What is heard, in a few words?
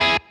Staccato